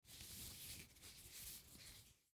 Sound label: Baseball Handle; Baseball (Sound Effects:Sports)